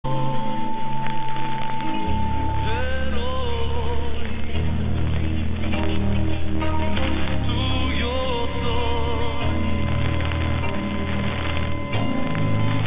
540 Kossuth Radio Hungary test tone ending and another multitone; MR1